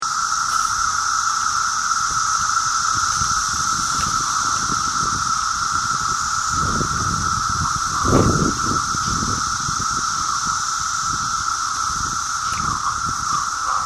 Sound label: Cicada01